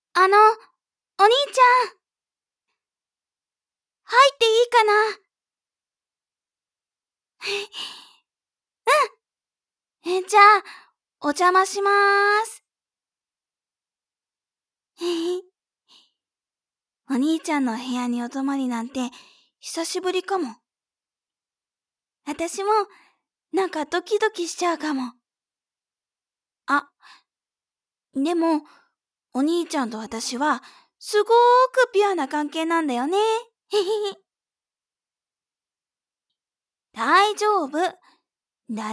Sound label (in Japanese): 耳かき, ASMR; 耳かき乙女; 彩子編